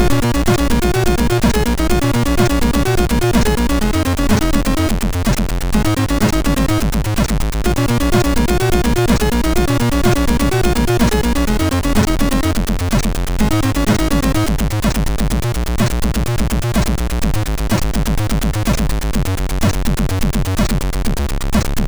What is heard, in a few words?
Fun Tune, MOS6581R3